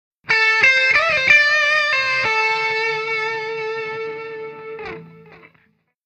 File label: Guitar Riff